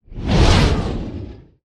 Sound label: Epic Swishes; SwooshSounds-2